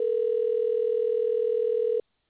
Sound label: ringback; baresip